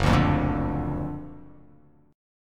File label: F sharp Augmented Major 7th, down